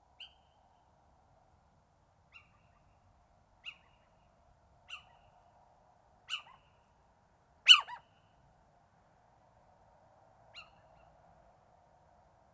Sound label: Little Owl Athene noctua